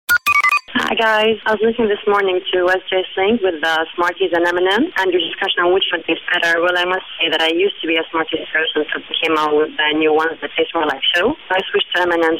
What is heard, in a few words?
phone tweet